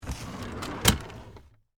File Cabinet Drawer, Open, Fast, Full, Wood, Antique; Foley; BlastWave